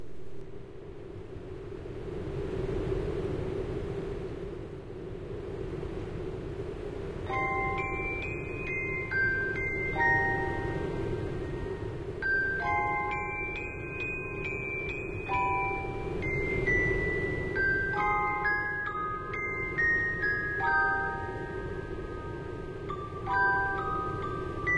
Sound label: clockwork melody; homestuck